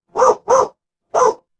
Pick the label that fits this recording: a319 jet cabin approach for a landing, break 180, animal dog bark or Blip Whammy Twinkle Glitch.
animal dog bark